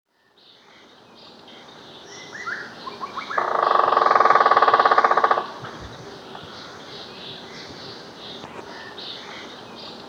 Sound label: Woodpecker, Bird Calls